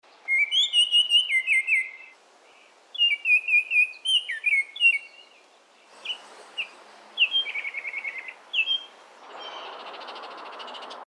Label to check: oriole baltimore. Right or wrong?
Right